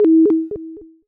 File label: crystal; Hylics